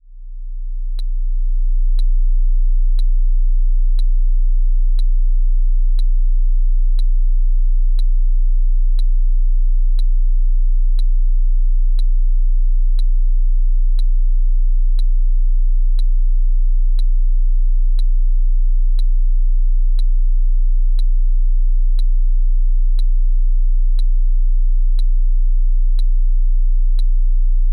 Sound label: 30Hz right + ticks; Audacity